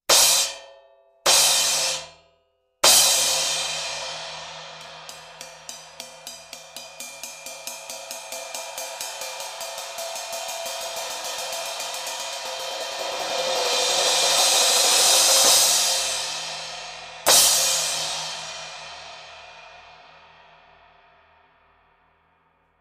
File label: Mist 18 Inch Brilliant Medium Crash